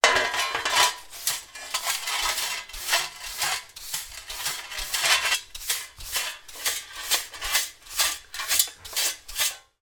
brushing up dirt from floor with metal dustpan and brush